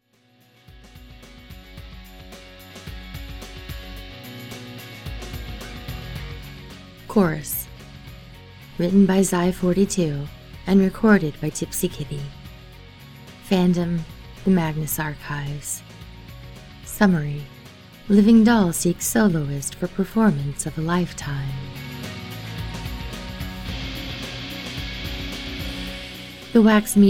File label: chorus sfx